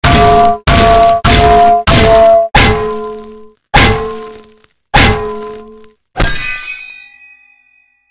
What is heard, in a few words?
frying pan; ضربه زدن با ماهیتابه